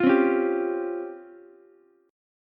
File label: C sharp Major 7th Flat 5th, C sharp, Ukulele; C#M7b5 strummed; 10,12,9,10; JGuitar